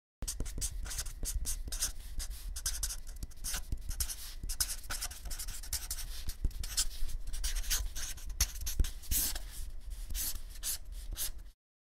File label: marker on paper